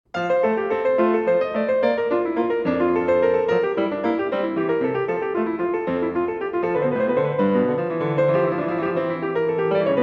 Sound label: Prelude & Fugue in F major